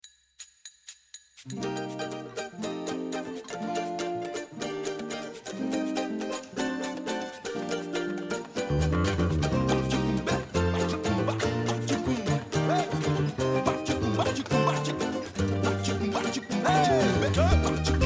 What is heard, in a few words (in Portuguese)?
Batucada Pagode; Brasilena Evento